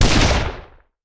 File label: Rifle; Sound Ideas; Guns